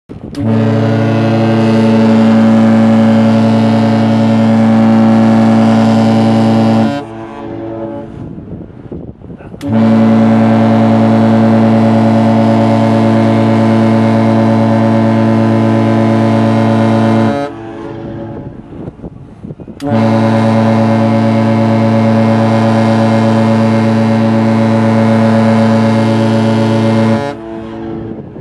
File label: Horn